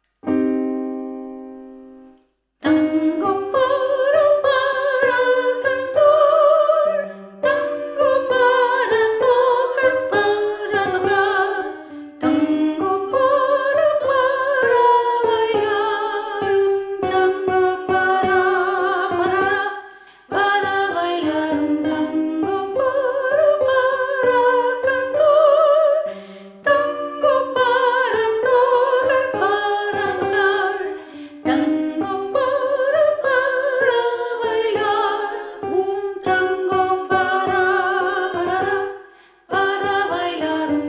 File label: Soprano